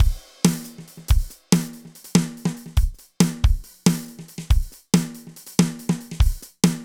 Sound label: V Drum Loop